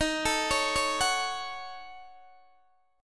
D sharp Minor 7th Flat 5th D sharp down Guitar Open D